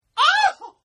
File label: Shriek; Screaming; Voice; Shriek 1